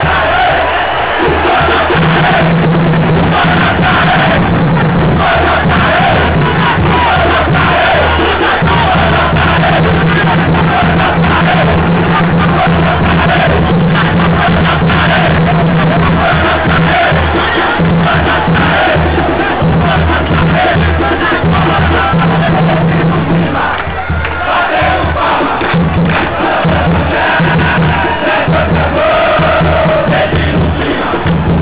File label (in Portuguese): gritos